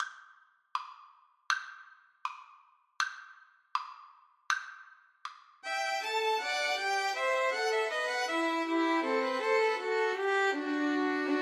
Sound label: bourree, Violin Trio